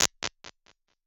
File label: 282 Delay, Impulse Responses; Reverbs; PastToFutureReverbs; SST; CLA; IRs